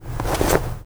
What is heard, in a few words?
land foley